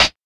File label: 2000s clean closed hi hat sound d sharp; hat samples